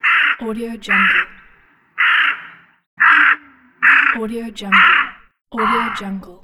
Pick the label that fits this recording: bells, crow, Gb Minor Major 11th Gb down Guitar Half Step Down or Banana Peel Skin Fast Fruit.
crow